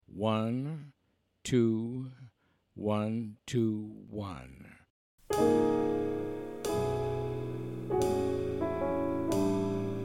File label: minus Bass